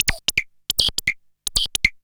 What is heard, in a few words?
BIRDY 160, Hard & Loud Techno; USB Soundscan vol.01; AKAI